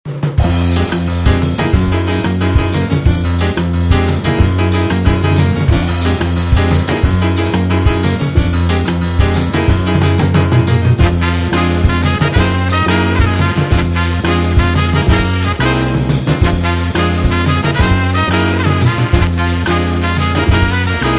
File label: welikefunkyjazz